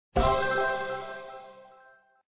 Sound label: match; fairies